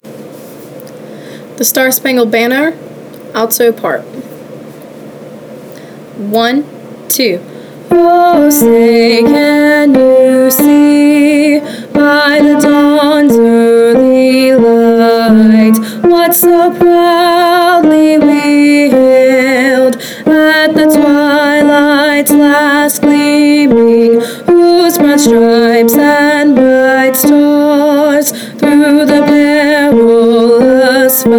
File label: the star spangled banner alto